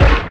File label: Weapon Laser